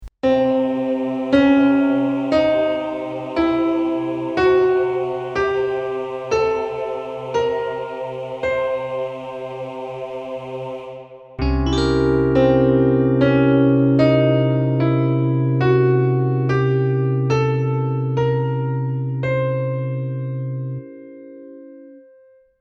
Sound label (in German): Halb Ganztonleiter